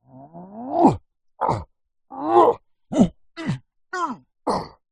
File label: fantasy giant male vocalisations fighting